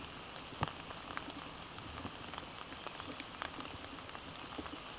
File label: Certhia familiaris